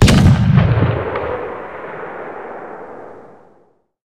art cannon shot far; guns; War Thunder

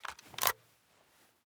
lr300 remove mag; Rust

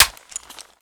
wp foley mp5k reload clip in; Call of Duty Modern Warfare

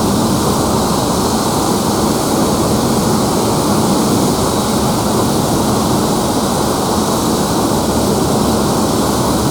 Aircrafts Engines Mig15 Around Engine; DCS

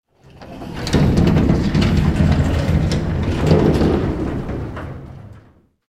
airstall rattle, engines; War Thunder

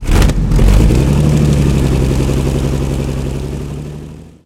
cromwell engine load, tanks; cannons; War Thunder

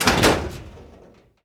Aircrafts Bf-109K-4 Gear Unlock; DCS

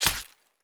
knife bayonet hit; Escape from Tarkov

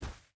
footstep boots snow run; Rust

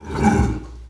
Panther Fort Vox; Civ4 warlords

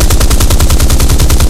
hs mk2 loop, guns; War Thunder

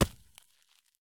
soil sprint; Escape from Tarkov

